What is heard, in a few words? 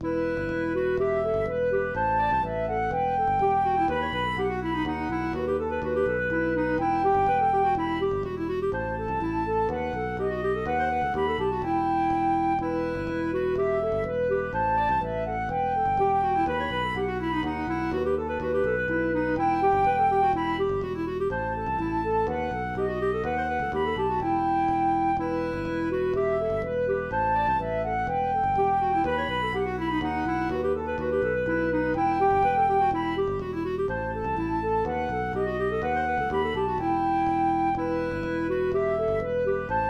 Italian Song; MTWFC VOL1 Audio Accompaniments